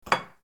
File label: cup